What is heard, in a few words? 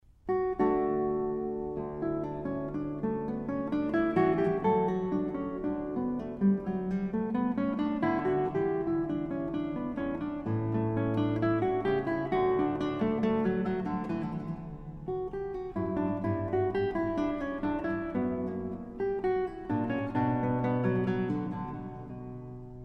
Cello Suite No1 Allemande; Johann Sebastian Bach